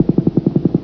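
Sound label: machine gun burst; ambience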